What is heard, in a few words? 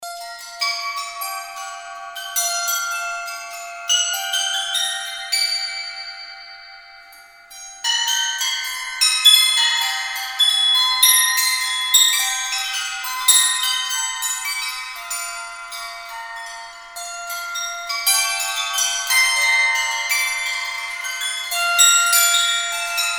Icicles Pop Ice